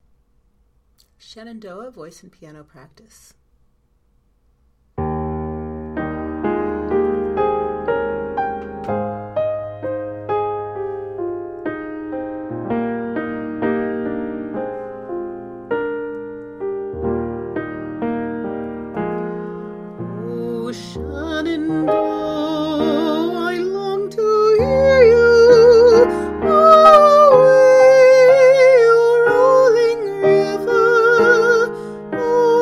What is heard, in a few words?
Voice Piano Practice